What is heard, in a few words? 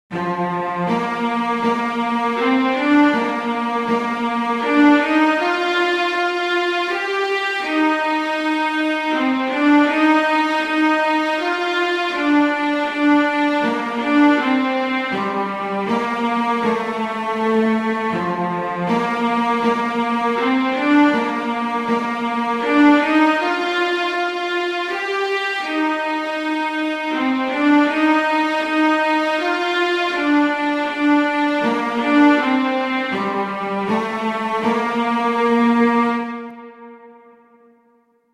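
Away in a Manger, Viola; Christmas Beginners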